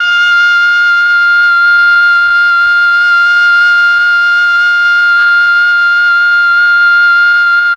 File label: MEL OBOE